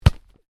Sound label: Football kick close, Soccer Ball: Kick Ball, Close Aspect; Sports